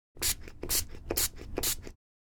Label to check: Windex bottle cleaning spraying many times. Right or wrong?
Right